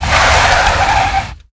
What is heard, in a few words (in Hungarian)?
fekezes; Pure Pinball